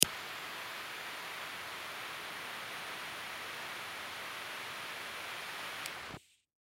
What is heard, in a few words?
Lighter Ignite, Lighter Flick Ignite Gas Release Butane; Lighter Sound Effects